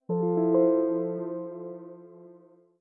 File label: Windows Notify Calendar